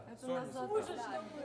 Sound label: Overhead